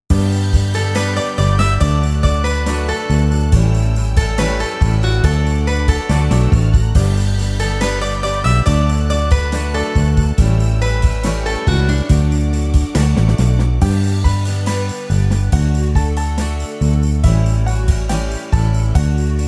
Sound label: Karaoke